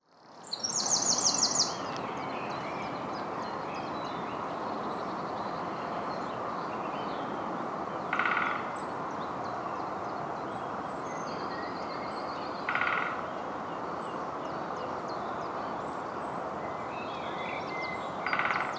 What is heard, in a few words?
Woodpecker; Coedbach